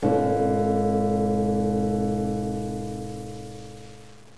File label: ding